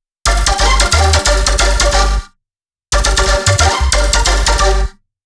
Bass Loops

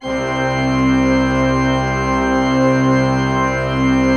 C2, Cathedral Organ; ILIO; Propeller Islands

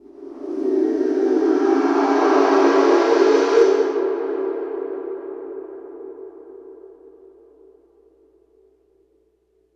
IMPACT SCARY, Cinematic Impact

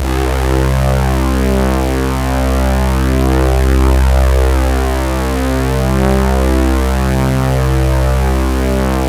C1, Synth Line; 90 s Sample CDs; NiceBeats; SynthLine VOL-26

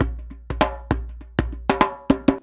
100 JMBSOUL; Univers Sons; Basicussions

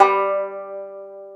Tenor Banjo G3; Planet Earth; Digital Sound Factory Planet Earth

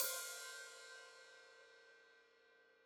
Hat Open, Hi-Hat; Cymbals Stereo; Deeply Sampled Drums

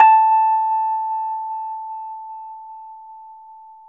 Rhodes 73 Slow, A; E-MU Producer Series Vol. 5 – 3-D Audio Collection; 3DRhodes

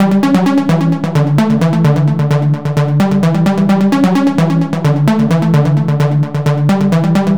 Progressive Synth, Trance

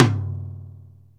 tom, Yamaha RX-5

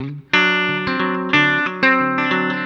COUNTRY

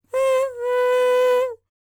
HUMAN TRPT, Jazz FX; Spectrasonics; Vocal Planet

